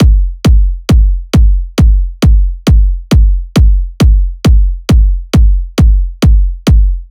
Kick Loop 135, Techno Drums

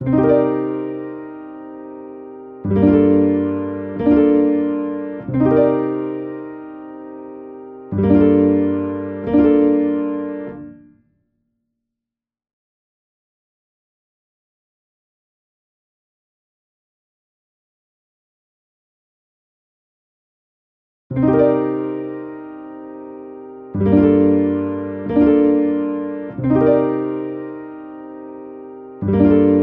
91 BPM G Min Keys; Loop Stems; Cymatics; Gravity

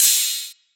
Ride, Dubstep Drum Shots; Rides & Crash; Demonic Ride; GhostHack; Ghosthack - Demolition - Free Dubstep Samples

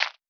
Percussion; Eternity; Cymatics; Lofi Starter Pack

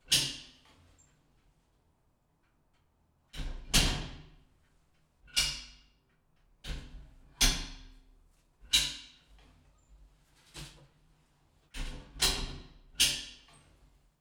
Bathroom Shower Door; Fairlight Sound Lib

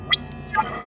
Glitch

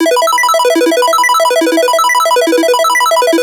Arp E 140, Melodic Loops; FastArp; Ghosthack - Color Bass Essentials

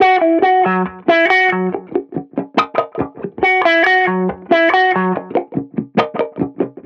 Reggae Guitar Loop 140 BPM F# Maj; Vibrations; Cymatics; Vibrations Guitar Sample Pack

